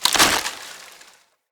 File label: Cage Destroy; Avakot Ode; Level Events; Midrath